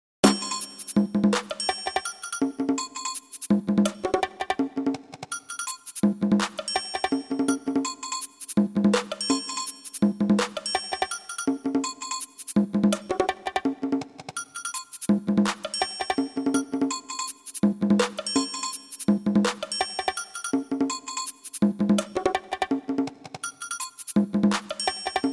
percussion medley

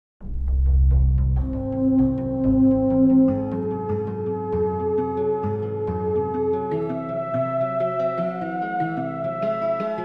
beautiful 13 limit harmonic series melody

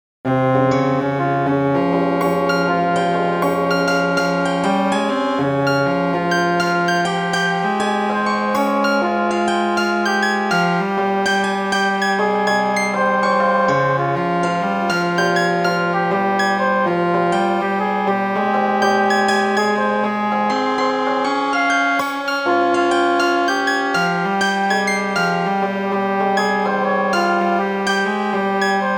cos one over x vibrato

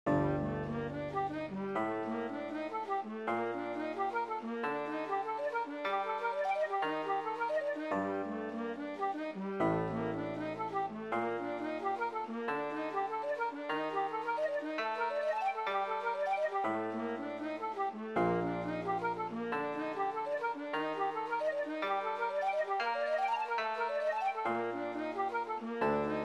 koto and shakuhachi

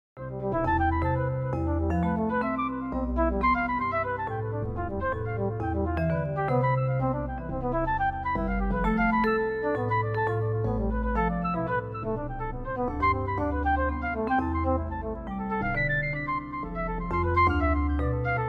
DNA music

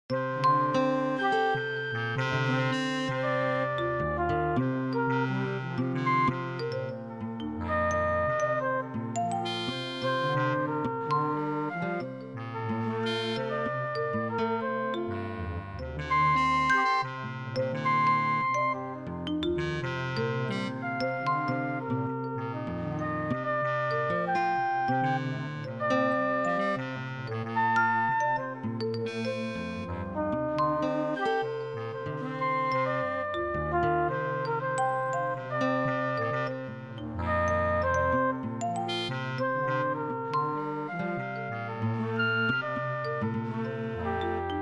very septimal dorian; Al Farabi's

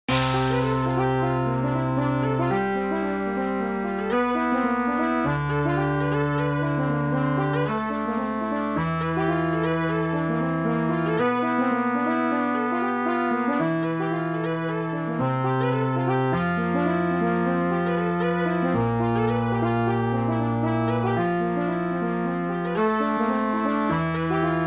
alternating three beat fibonacci tonescape

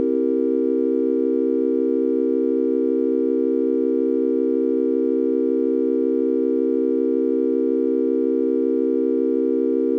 16o9 dom 7th

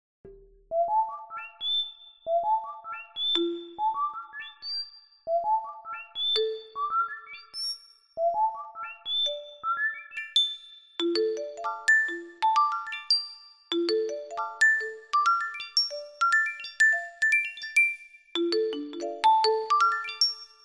birdsong rhythm bluesy minor chord, fractal music; hocketting

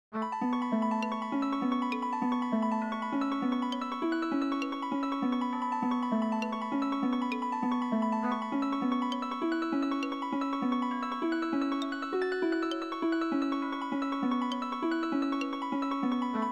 fractal percussion with harp; fractal music

